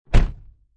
Car Interior Door Close Hard, Car Interior, 1996 Acura Integra, Door Close, Hard, No Engine; Transportation Automobiles